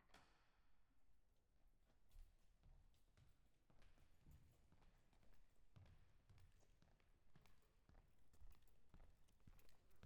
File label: cello; chamber96